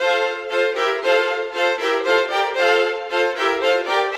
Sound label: Violins, Orchestral; Sonic Foundry (Sony Creative Software); Orchestral VOL-2; Modern 31